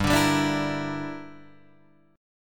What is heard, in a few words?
G Minor 6th, Guitar Open D